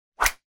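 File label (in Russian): рубящий воздух звук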